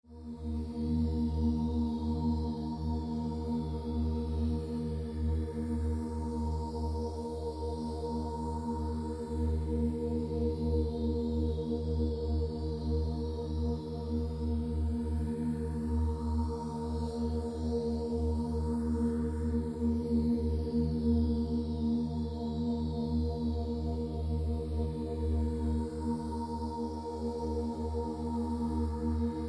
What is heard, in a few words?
D 100 Acc GTR chill groove